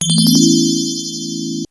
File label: Coin; Galaxy Force 2